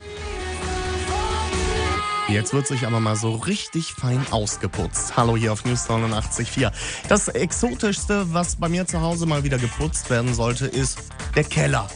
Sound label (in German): Orgel; Buettgen